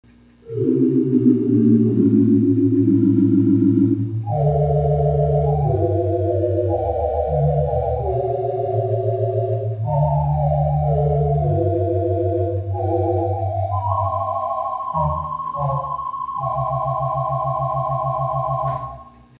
Tibia, theatre organ

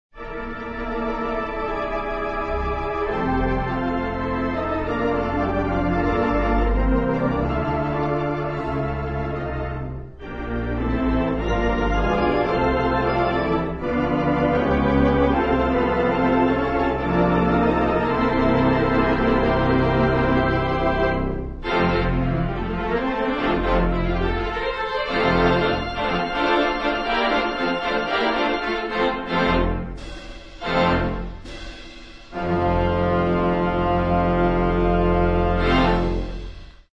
theatre organs

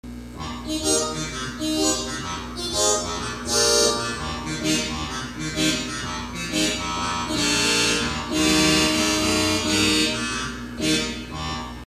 Kinura

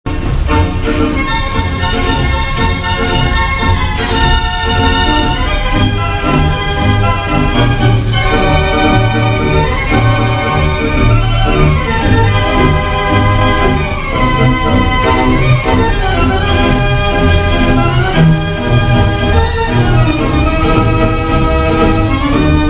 delico, theatre organs

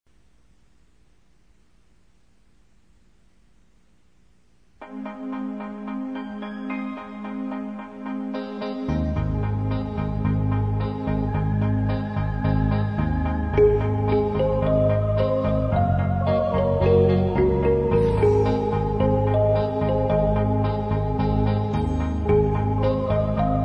Midnight Prairie Train, hammond

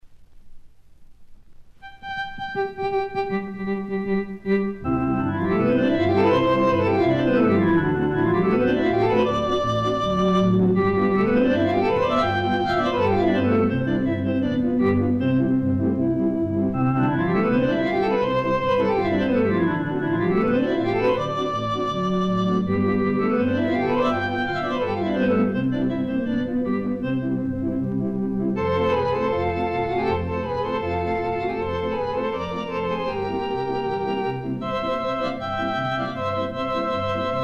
Juke Box Polka, hammond; Ken's Compositions

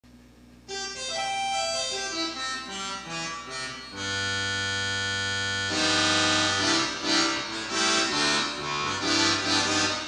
Posthorn, theatre organ

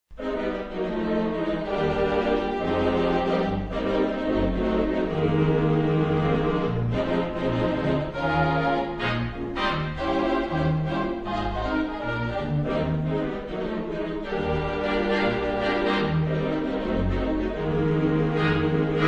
theatre organs

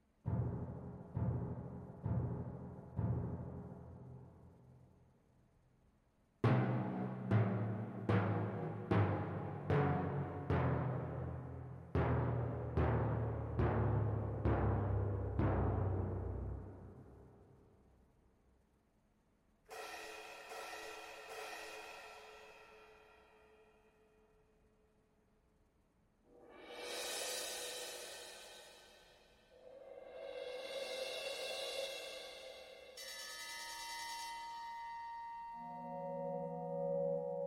PEDAL Traps & Toys; p450 ranks

